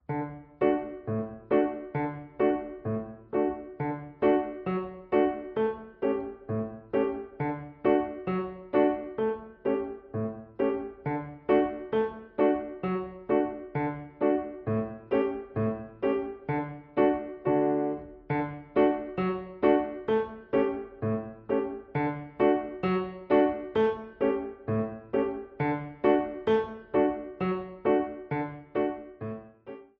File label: scale reel playalong